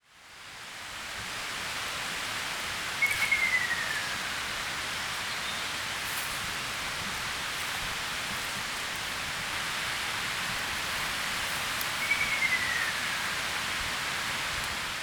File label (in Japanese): akasyoubin, bird song; アカショウビン; さえずり; 福島県只見町